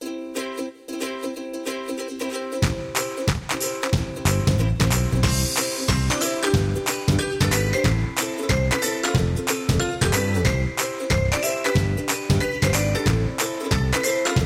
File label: music